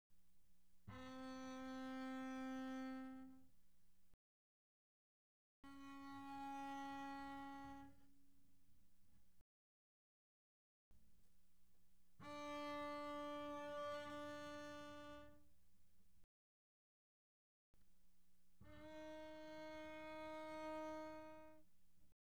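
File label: Bass arco pianissimo sul G C4 G4, doublebass; Strings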